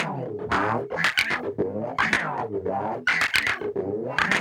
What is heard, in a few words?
GUI, licks; 110 LICKS